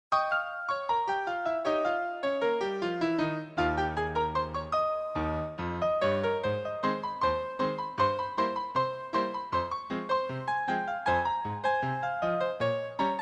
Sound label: sewing machine rag